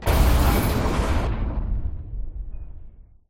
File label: giant robot down